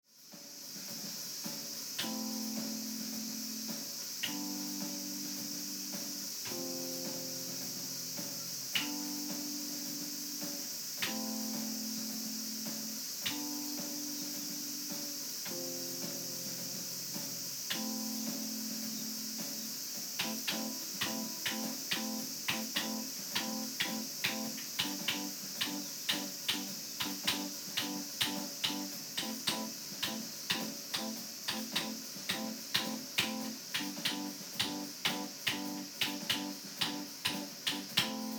nanokey2

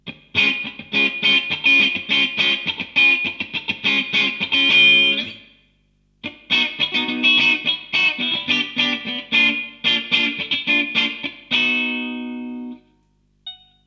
G3 blues riff

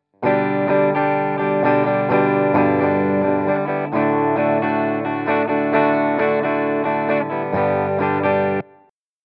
iRig guitar clean

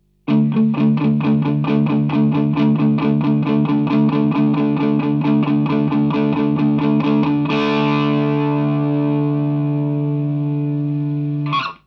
riff

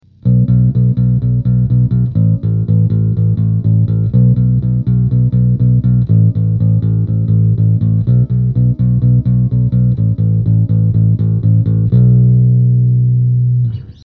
elixer bass

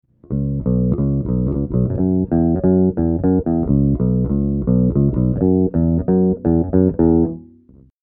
iRig bass

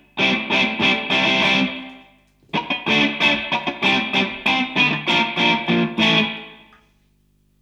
crunch riff

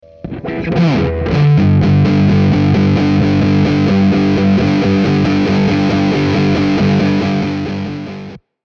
iRig guitar distortion

